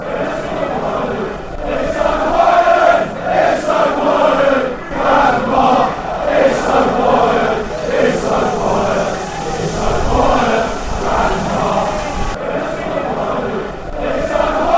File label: its so quiet fratton park, fan chants